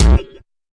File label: fx drum, Electro FX